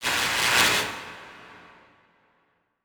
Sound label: Transition Electrical Surge; Adobe; Production Element